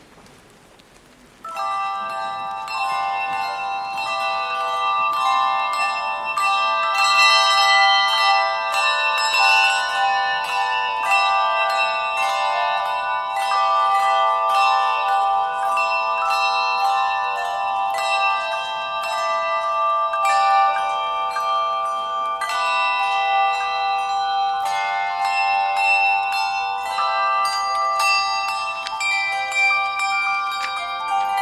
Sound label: Anthem, Carillons